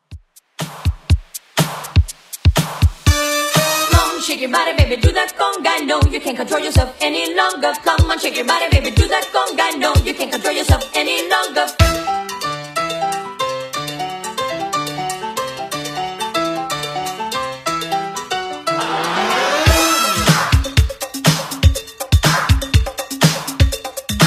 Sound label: 80s Redrum 122bpm